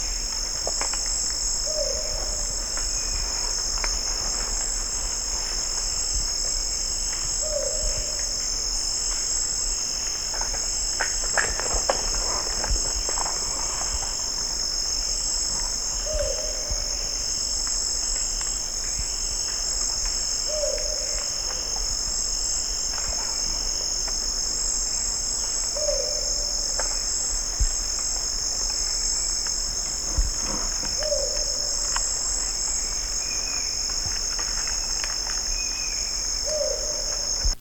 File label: rufous winged ground cuckoo